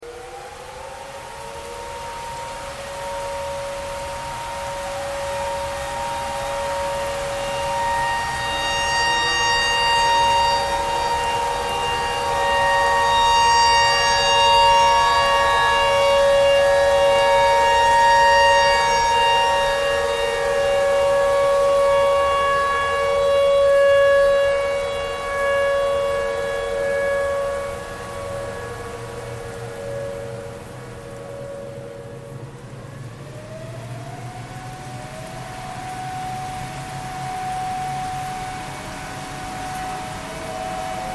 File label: tornado siren tornado siren thread pentatonic